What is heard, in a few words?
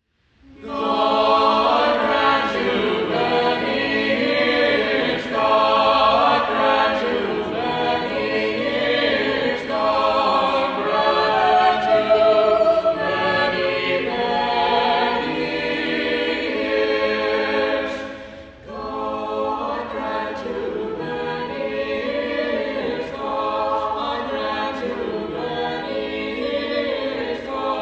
choir